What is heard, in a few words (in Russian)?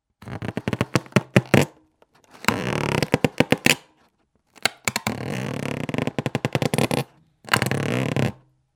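звук трения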